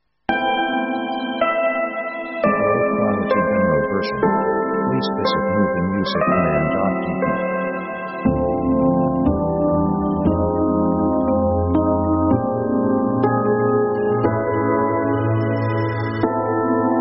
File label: jazz; jazz themes